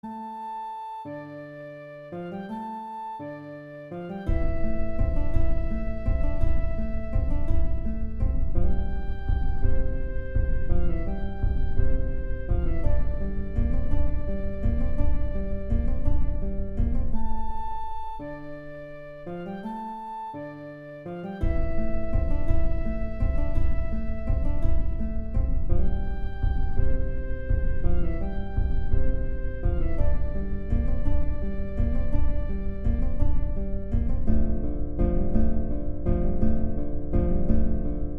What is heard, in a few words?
duo flute guitare